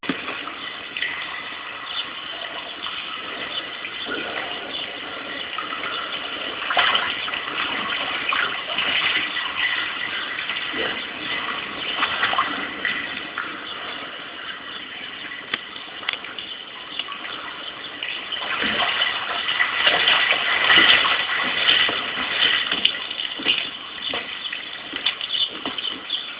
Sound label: pomp station, ambience